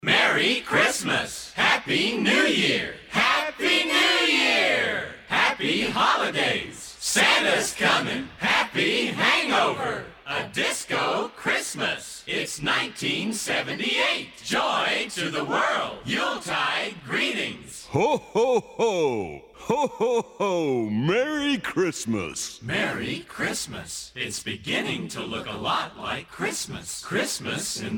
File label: christmas kit group shouts medley mixdown